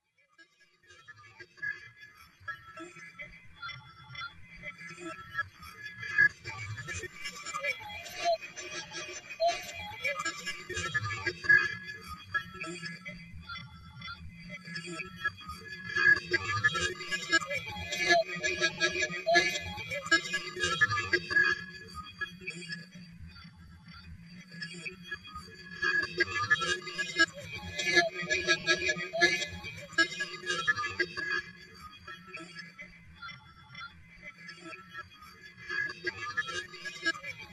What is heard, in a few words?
ducks down